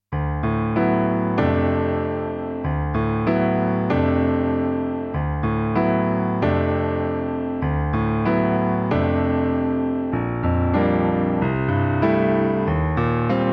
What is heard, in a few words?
karaoke